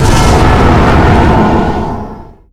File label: spell Cast Evoke; Nevergrind